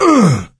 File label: hurt minor, survivor voice; Left 4 Dead 2; coach